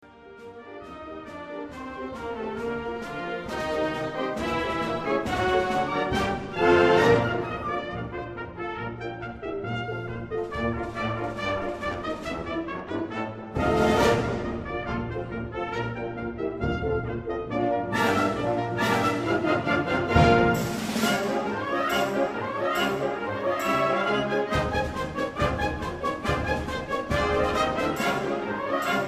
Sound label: military march